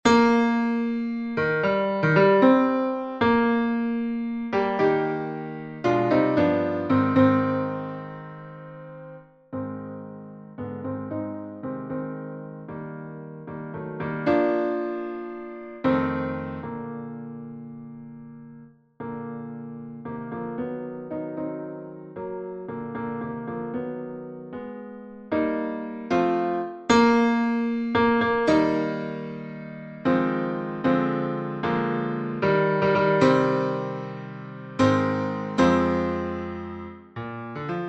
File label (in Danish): tenor fremhaevet